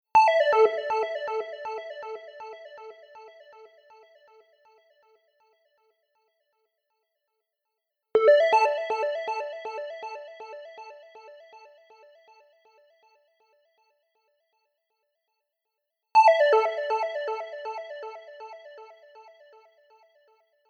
Synth Prog House Lead

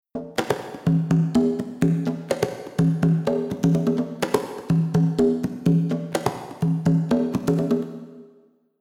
Reverb Filter Off

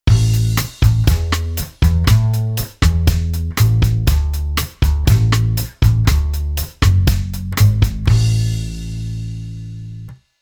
Bass Arr Samba

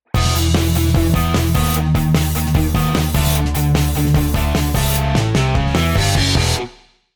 guitar pattern

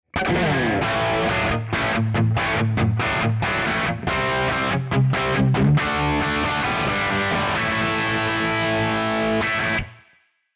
guitar EG

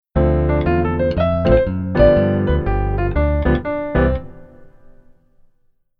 Keyboard Solo Right Hand

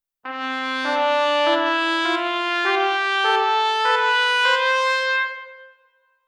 Legato Miss

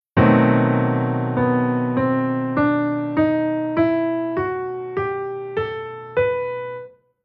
Chord Chord Scale Dim7

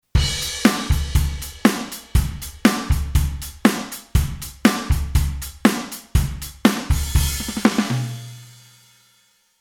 Rhythm Kit Pop

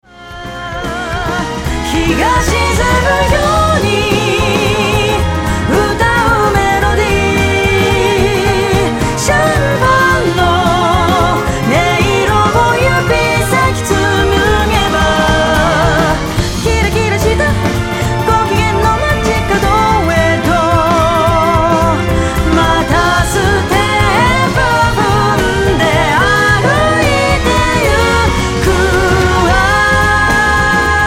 Mix Master Comp On

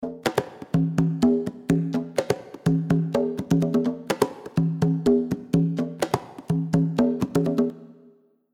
Reverb ER Off

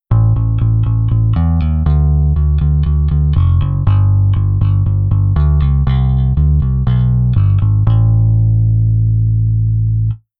Bass Qua On

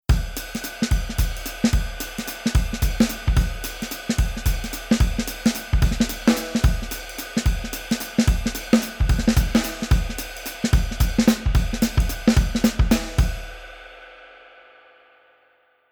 Jazz Waltz Drums

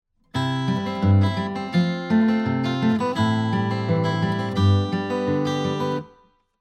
guitar 3 finger

